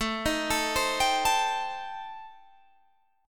A-Minor 7th Flat 5th, Guitar Half Step Down, chord